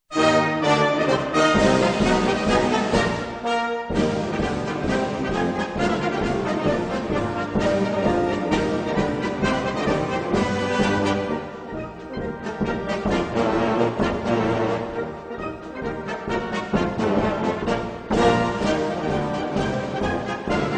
banda; J. P. Sousa; Stars and stripes forever

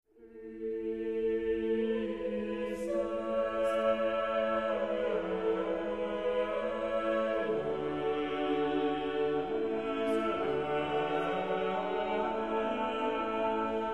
cappella

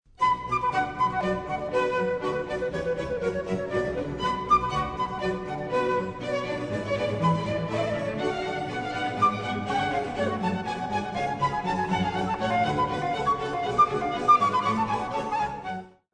badinerie, flauto e archi; frammento iniziale della Badinerie dalla Suite in si minore BWV 1067 per flauto e archi di J. S. Bach

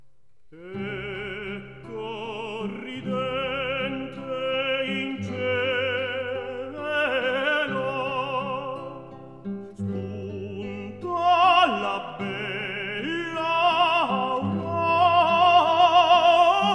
tenore, voci liriche

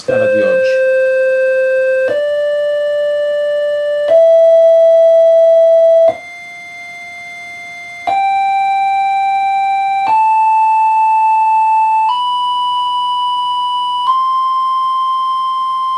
scala temperata

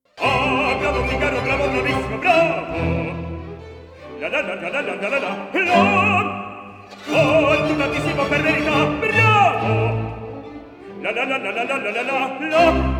baritono, voci liriche

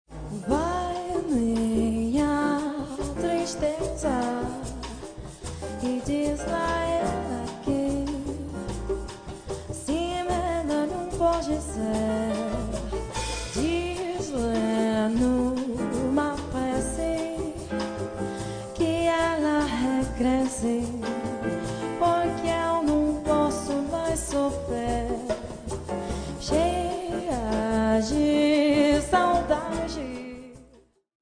bossa nova; La bossa nova è una danza dal ritmo molto lento, che deriva dal samba brasiliano.; Il termine, in lingua portoghese, significa "onda nuova".

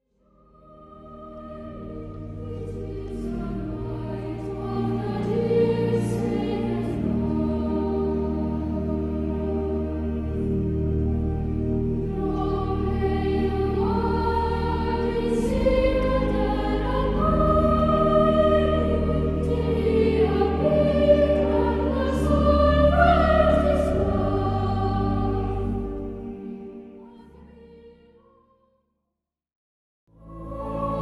coro voci bianche

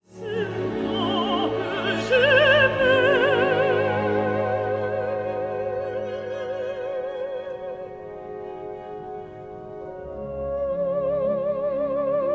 contralto

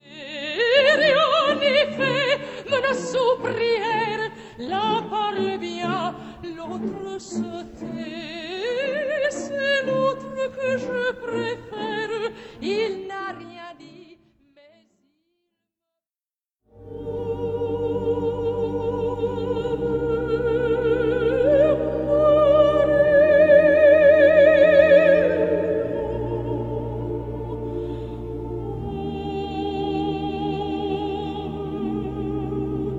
mezzosoprano, voci liriche

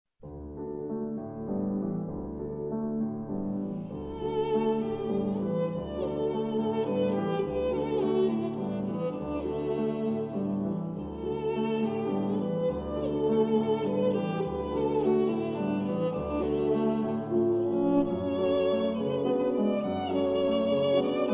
berceuse, violino e pianoforte; Berceuse di G. Fauré per violino e pianoforte

